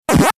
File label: coin; mspacman; games